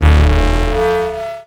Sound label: Transition Hit Impact Distorted; Production Element